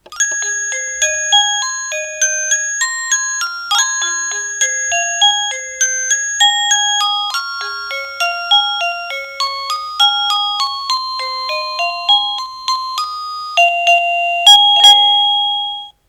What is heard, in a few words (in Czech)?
melodie